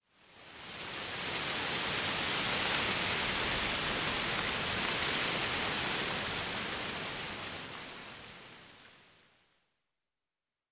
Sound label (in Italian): brezza e foglie; Inquisitor 2